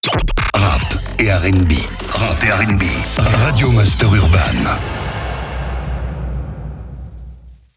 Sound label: JINGLE; RADIO MASTER URBAN; Habillage